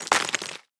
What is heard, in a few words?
debris concrete, bullet impact debris